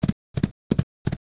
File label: Trot loop